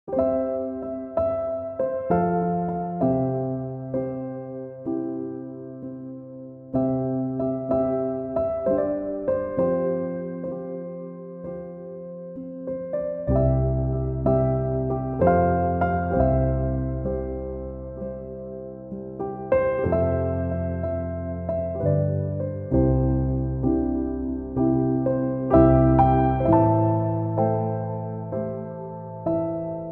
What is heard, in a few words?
chill piano instrumental